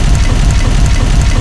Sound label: stone slide, doors; gesource